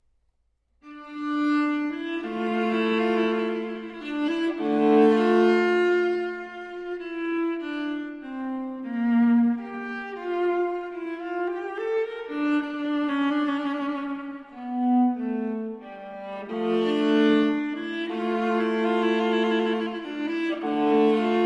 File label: Cello Suite No. 2 in D Minor, for Viola, Sarabande; J.S. Bach Cello Suite No. 2 in D Minor, BWV 1008 (Transcr. for Viola) IV. Sarabande